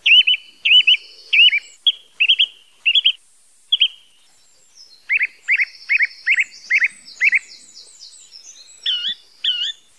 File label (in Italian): TORDO SPAGNA, birdsound